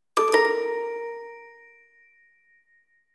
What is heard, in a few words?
Text Tone; Oppo Find X3 Pro